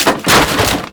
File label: explosion metal armor detail; Explosions